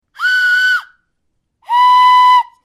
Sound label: laser whistles